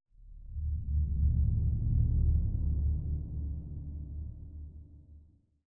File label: train boss defeated